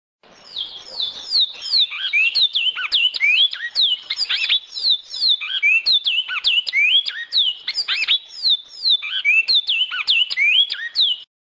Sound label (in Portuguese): canto bicudo